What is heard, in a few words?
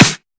nice acoustic snare f sharp key